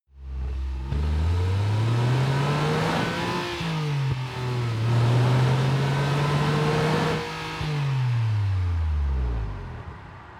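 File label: sports car interior engine rev up; verkehr transport; autos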